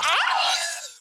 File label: Female zombie Death; Zomboid 41.78.16; Project Zomboid